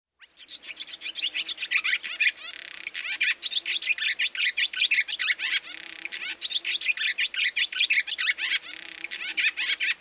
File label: barn swallow